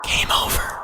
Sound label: game over; Sonic 06